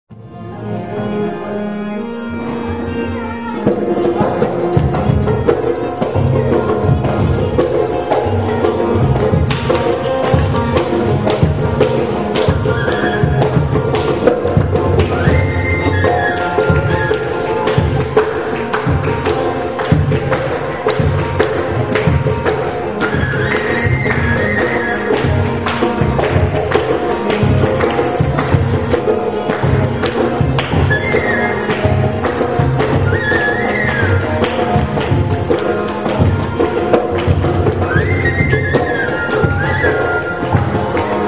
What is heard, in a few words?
bihu tune, Field Recordings from India; WFMU